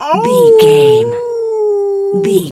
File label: wolf howl short